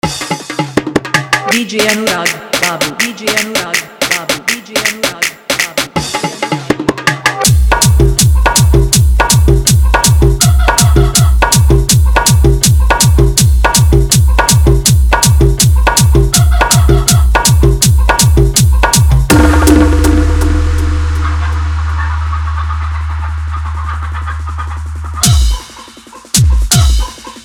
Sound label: Jhan Jhan Bass Dance Mix